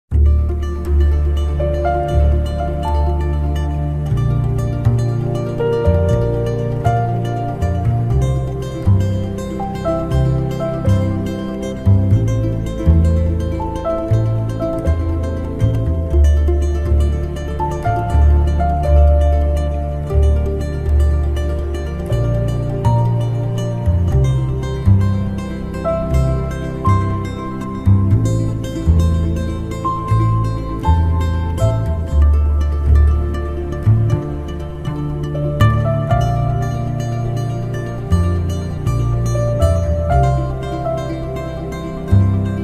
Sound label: piano; turk serial